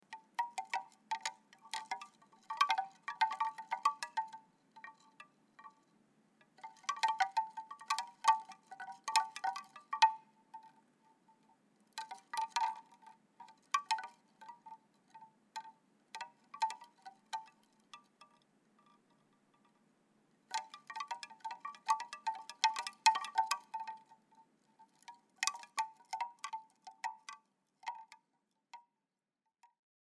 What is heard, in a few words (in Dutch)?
Windgong bamboe Levensboom 66cm; Woodstock Chimes